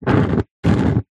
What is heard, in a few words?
nature fire flame air wind blow blast fast short